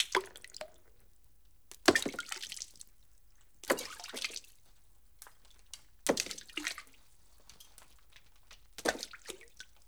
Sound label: Splashes Flat Hits